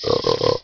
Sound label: Tusker Charge Miss; The Battle for Wesnoth